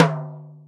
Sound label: Jazz Tom, Drum Machines Roland R-5